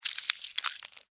Insect, Eat, Chew, Bite, Bug; Insects Eating; Blastwave; Animals:Insects

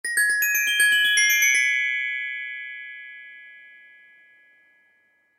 Glockenspiel Melody Twinkle; Mallets; Musical; Blastwave

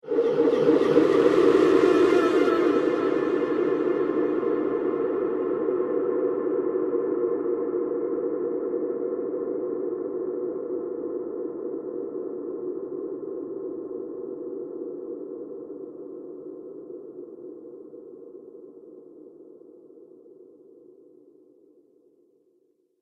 Screams Horror Atmosphere, scary soundscape

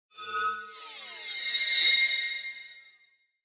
Crystal Whoosh Rear To Front; Crystal Feedback Whoosh Front To Rear

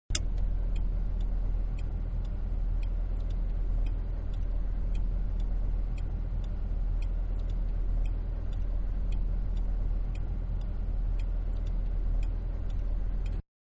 Signal Light Sound Interior, Van; Cars; Vehicles; Manhattan Production Music

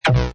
Hi Tech Panel Servo Button

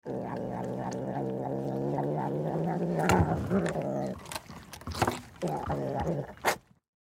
Cat Angry Growl Hiss; Animal; Cats; Blastwave

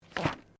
Book Page Gloss Turn; Book movement; Books (Sound Effects:Offices)

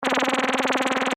Filter Short Gurgle, Electronic, Science Fiction; Tech; BlastWave

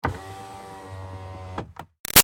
Car Highlander Power Window Up Short; 2005 Toyota Highlander V6; Cars; Vehicles; Big Room Sound

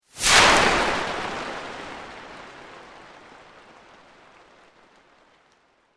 Sweep Organic Medium

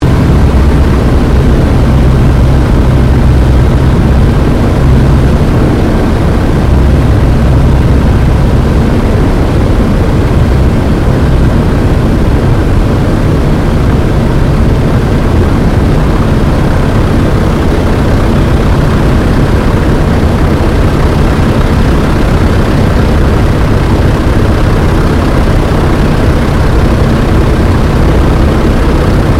AIRCRAFT T-28D TROJAN LAND INTERIOR; Airplanes; Vehicles

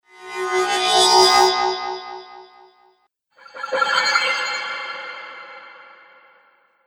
Magic Spells; Magic Sound Effects

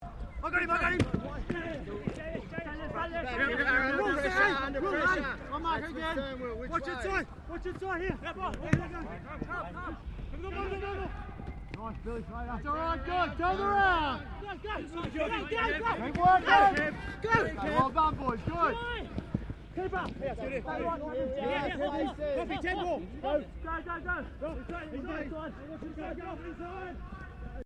RUGBY LEAGUE TEAM TRAINING; Various rugby sounds; Miscellaneous Sports (Sound Effects:Sports)

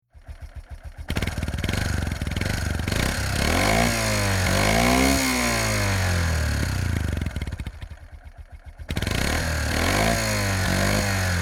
Motorbike Quadbike 400Cc Run Revs Off Engine; Transport; Atv; Tom Hutchings

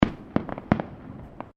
Fireworks Multiple Firecrackers

